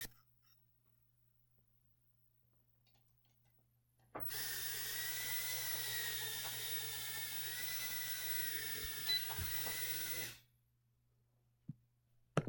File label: Drone